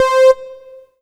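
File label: Yamaha TG100 Saw Lead C5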